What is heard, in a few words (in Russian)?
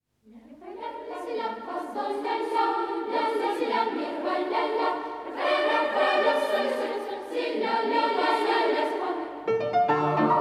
Итальянская полька; russkaya klassika